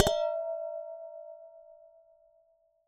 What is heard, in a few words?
Strike, Vase